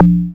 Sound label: A#, Synclav FM PERCUSSION; Big Fish Audio